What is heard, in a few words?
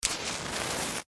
Match Strike, Fire Strike Flare; Flame Sound Effects